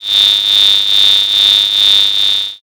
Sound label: cicada